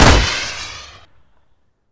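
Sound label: smoke explosion body; gmod; viper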